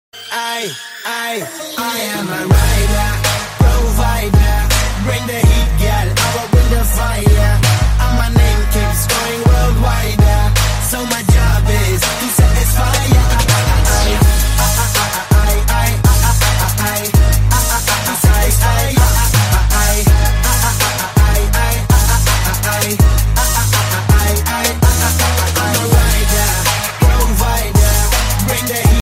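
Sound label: lovely sound music ringtone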